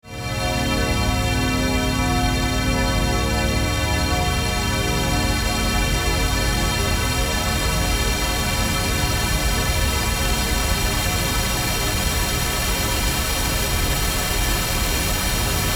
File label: String Filter Pink; tellun